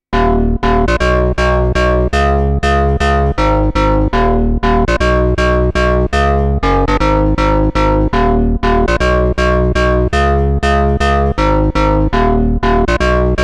Angry Bass; KORG Turbo

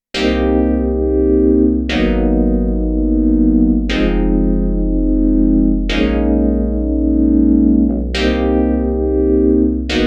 KORG Node Tasty EP

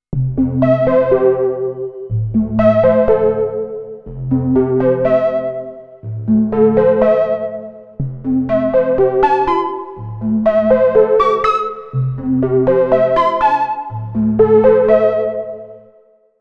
Resonant EP; KORG Tube

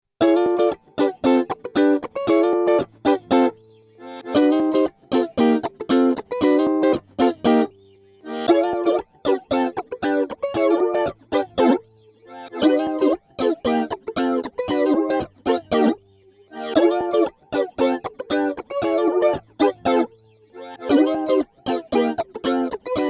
KORG Vibrant Electric Guitar

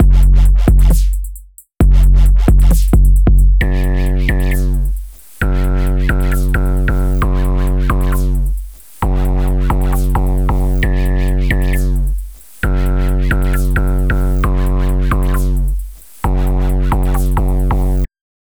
Trap Beat; Molecule

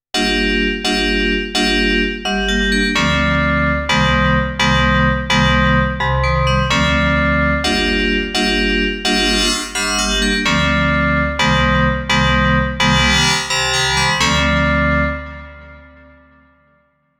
KORG Node Vibrant EP

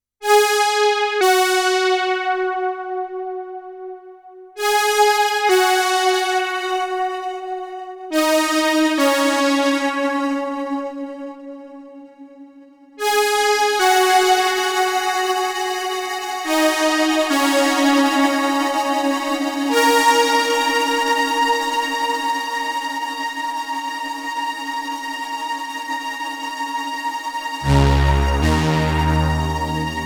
Lush Pad; Korg; Albedo

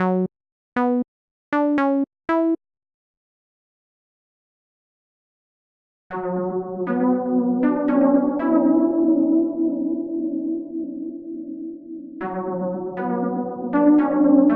Saw Lead; Dispersion

